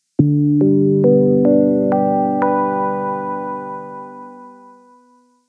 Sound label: Magic Bells; j106